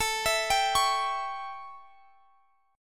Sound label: A 7th, chord; arpeggiated